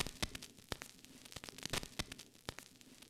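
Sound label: HIPHOP KIT, DRUMS; Kid Nepro; Elite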